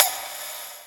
check off; Tokyo Ghoul v.1.0

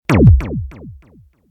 section fail

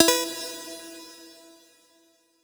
menu hit; Azer+ 2023

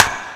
drum hit normal; hr + hr

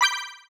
menu click; Obsidian

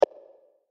click short; O2Jam UUltimate

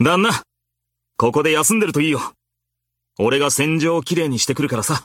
applause; tekkito unknown skin

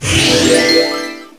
spinner; osu; kik0

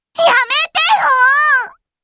sectionfail

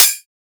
normal hit whistle; 『Chip 1.1』; Neuro

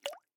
spinner bonus; Kurisu Makise v2.0

